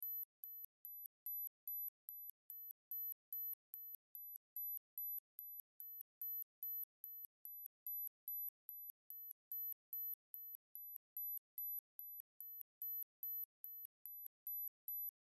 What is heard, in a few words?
Watch Alarm Digital; Technology; Blastwave